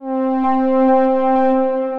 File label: Ambient Swells Pad, Synth Pad